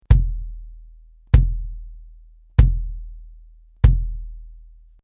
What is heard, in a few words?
BASS EQ3 Blk Reso Hard Plastic; evans; naciag do centrali rezonujacy